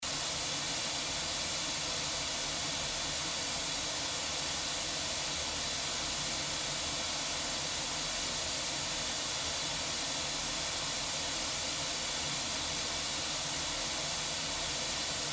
noise -12 dBFS

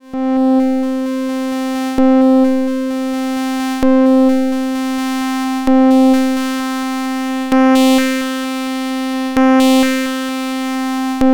Zing mit Symmetry Sweep; Rossum Electro-Music Trident

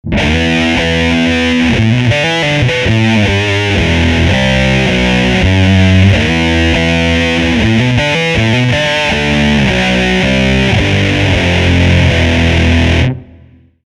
FUZZ

